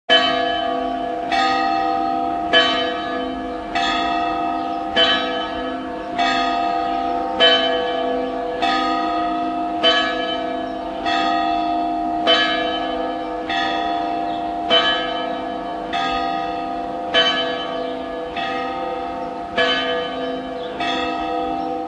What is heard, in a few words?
Chapel Bell; Orchestrion